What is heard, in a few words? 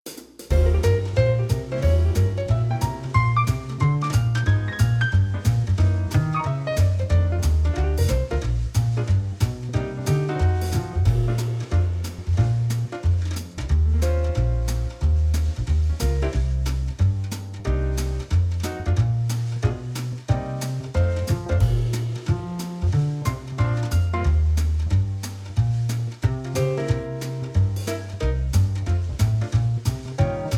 jazzville